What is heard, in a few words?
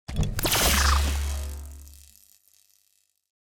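pickup, sword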